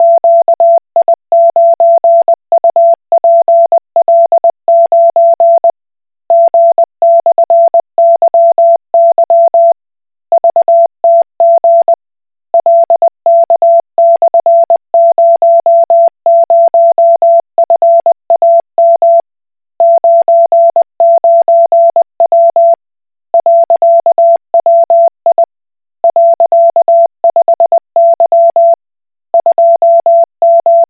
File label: Koch Morse